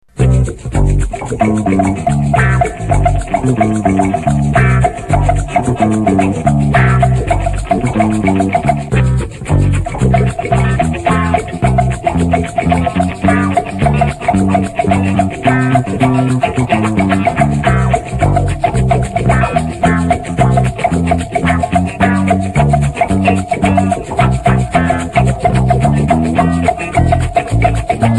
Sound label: Bongo Vamp